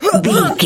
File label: voice female attack